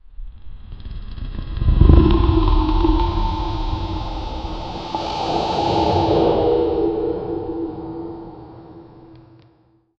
asmr

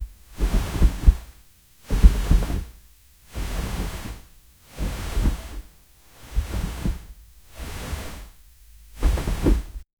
rhythmic bed movement

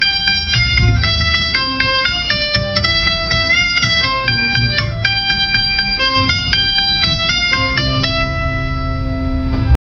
american wedding music

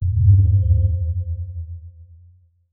a brief tension filled so

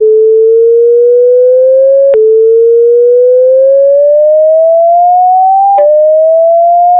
alarm

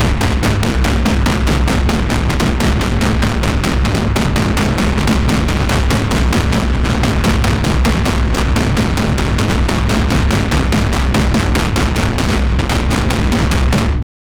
the sound of war drums, rhythmic

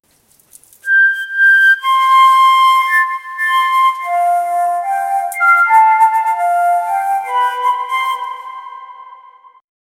flute

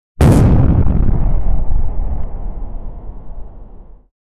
long water bomb, explosion

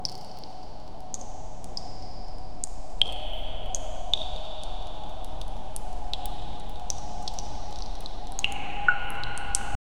distant water dripping in; reflections

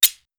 one pure bright snip as; colorful

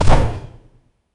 a clean arrow hit sound; bow and arrow

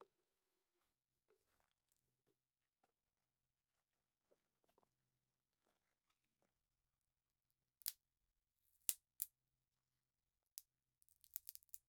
bamboo sticks tying

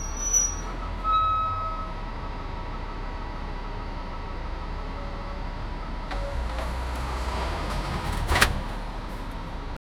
squeaky bus doors

